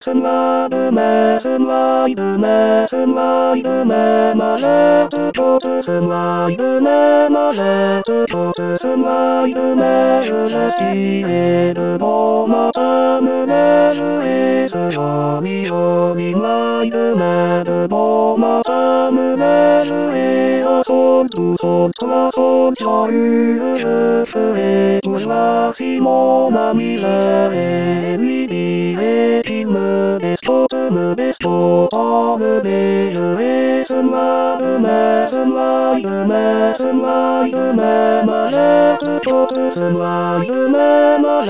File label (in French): mai tenor; 2023 st jean